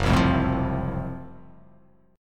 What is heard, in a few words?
F sharp Minor Major 7th Flat 5th